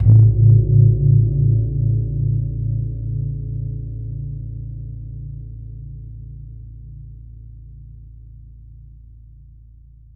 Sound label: GONG A1; USB Soundscan vol.60; Asian Traditions; AKAI